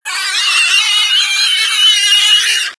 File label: tushkano miss